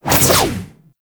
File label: Backflip Whoosh